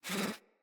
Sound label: Pet Alert Snort; Catbrow; Pet Ship